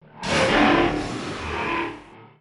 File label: Robot Tiger Roar